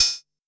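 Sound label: Hi-Hat Open, Luxor Passet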